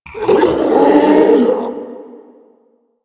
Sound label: Infested Ancient Strike Scream; Warframe